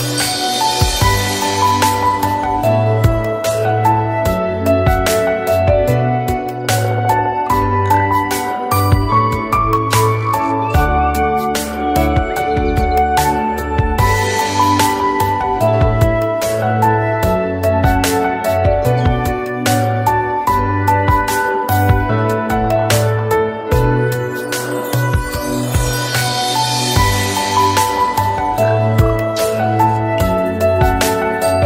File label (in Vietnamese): Piano Khong Loi